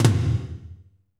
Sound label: TOM, Kit Dry; Northstar - Drumscapes Roland